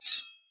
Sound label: get knife; firefight